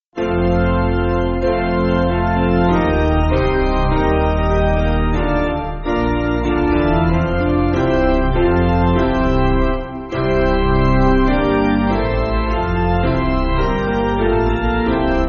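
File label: Piano, Music Backing Files for Churches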